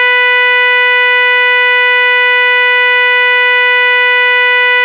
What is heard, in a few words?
spiral high, horn